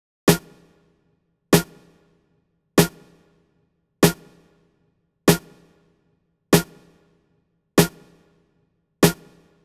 Snare, Drum Tracks, Amin; Big Fish Audio; Hip Hop Exotica

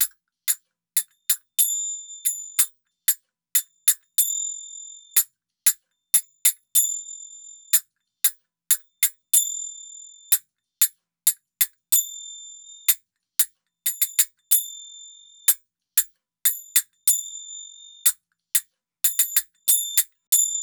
manjira 093, Hip Hop India; 093bpm; Hip Hop India Modern Percussion; Apple Loops

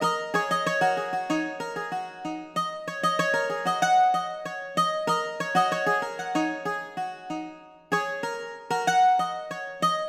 Bouzouki, 095 Ebmin; Hip Hop Exotica; Big Fish Audio

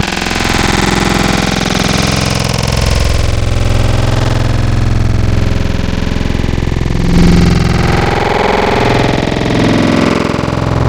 Removed, Growl Tables; Cymatics; Growl Tables Vol 2; Serum

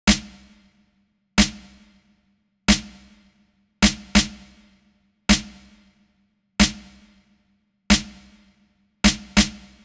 Snare, 092 Cmin, Drum Tracks; Hip Hop; big fish audio; Hip Hop Exotica; Apple Loops

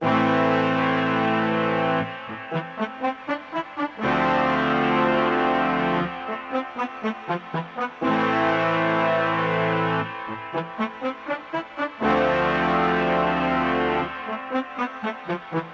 120 BPM A Min Brass; Cymatics; Destiny Gold Expansion; Blood Ties

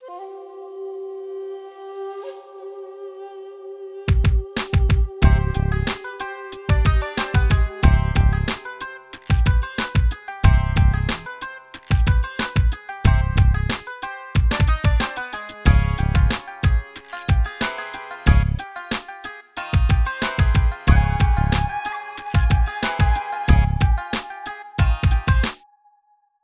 092 Cmin, Hip Hop Exotica; Apple Loops; Big Fish Audio

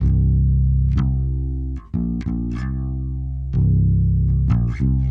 Bass, 094 Cmin; Hip Hop Exotica; Apple Loops; Big Fish Audio

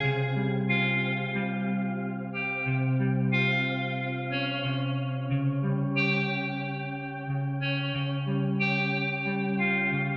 Synth, 091 Cmin; Hip Hop Exotica; Apple Loops; Big Fish Audio

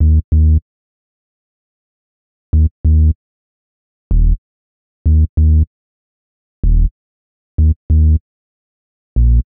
Bass, 095 Ebmin; Hip Hop Exotica; Big Fish Audio

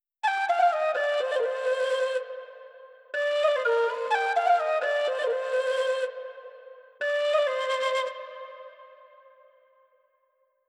124 BPM C Min Flute; Cymatics; Destiny Gold Expansion; Mount Fuji

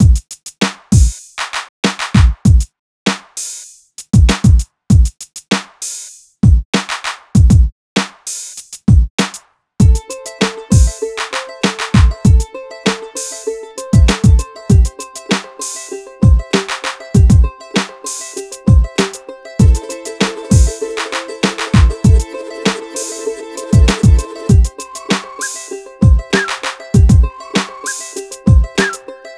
098 Amin, Hip Hop Exotica; Big Fish Audio

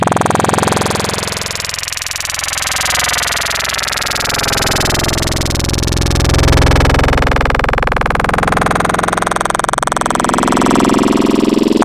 Growl Tables; Cut Wrists; Cymatics; SERUM; Growl Tables Vol 3

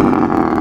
Sheep, Wavetables; Cymatics; Serum; Animals for Serum - Gold Edition

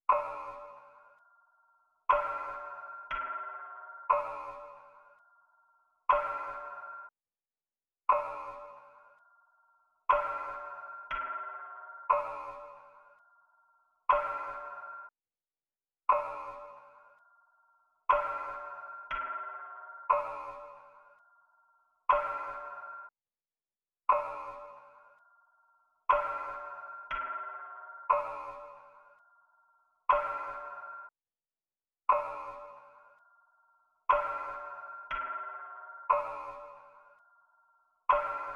120 BPM F Min Percussion Loop; Cymatics; Destiny Gold Expansion; Blue Magic

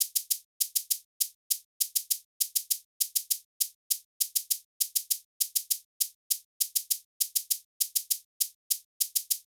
Drums, Hip Hop Exotica, 100 Dmin; Apple Loops; Big Fish Audio

